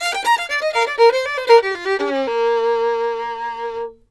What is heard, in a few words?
FIDDLE; Sampleheads; Whole Lotta Country 1 & 2